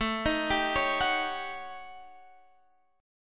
D sharp 7th Sharp 9th Flat 5th

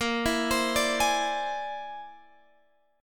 Bb 9th Flat 5th Bb down Guitar Drop D

